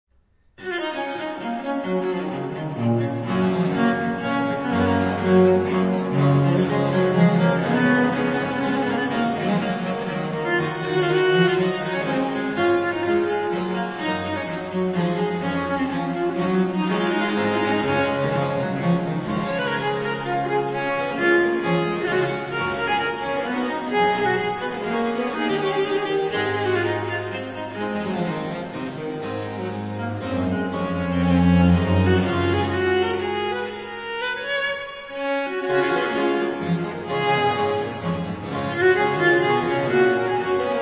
Marais solM III caprice

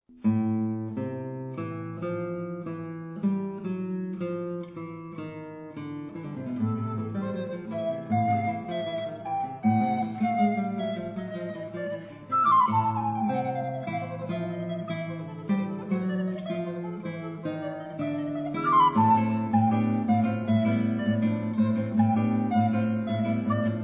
tango

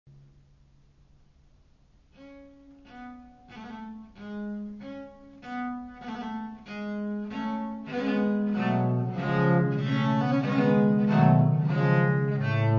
Tombeau Les Regrets carillon; SteColombe; Charon; Quarillon et Apel de Charon

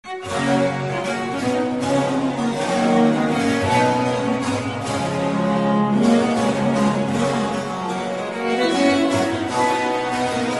courante suite sol majeur; Marais

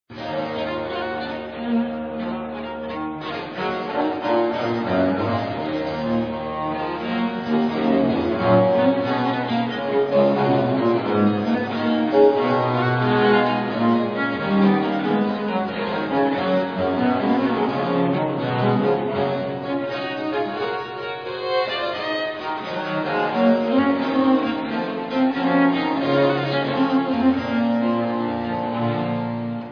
gigue; marais

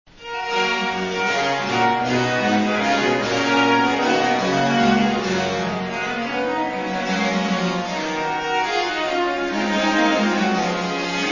3 violes; Marais; Courante; Suite en sol majeur à trois violes Ier livre

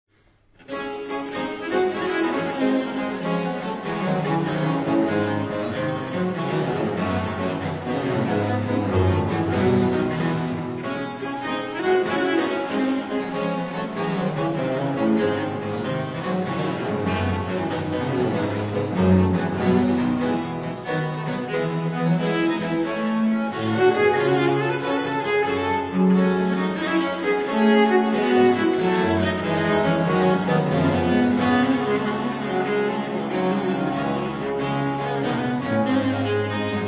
Gigue, re min; Marais

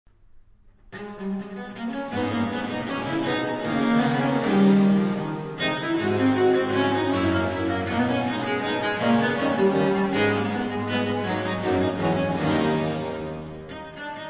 caprice suite A; Marais

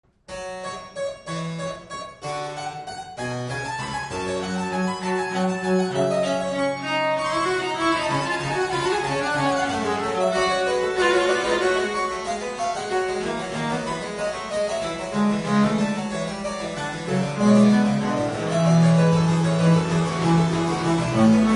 allegro

